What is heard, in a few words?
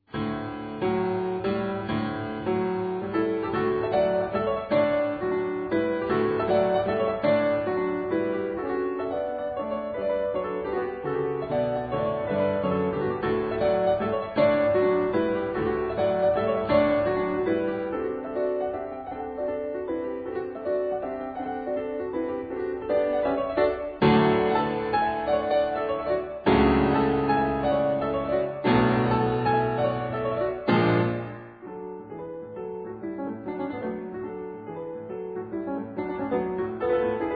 Springar Piano; Etter Myllarguten